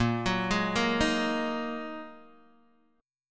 A sharp 7th Suspended 4th Sharp 5th, Guitar DADGAD chord